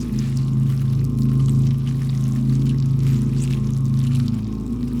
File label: ant ship weaver idle; Stellaris; biogenesis; ships